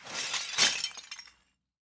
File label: rock tiles shaking; matter mayhem; soundmorph